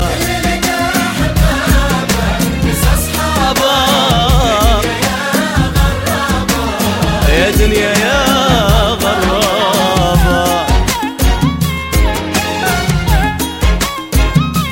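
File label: arabic ringtone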